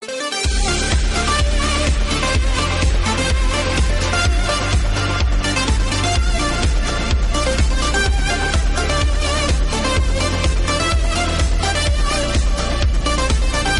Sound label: trance tune